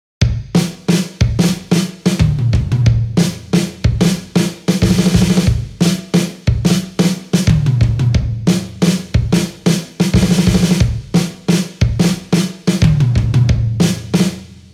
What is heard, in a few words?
guitar; fofix; drumtest